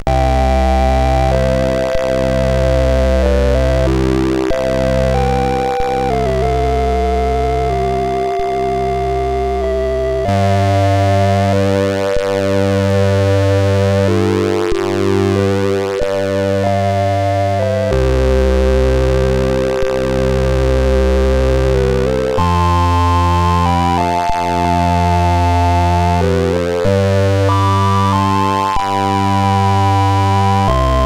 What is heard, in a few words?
sid CSG8580R5; Gremlins 2-The New Batch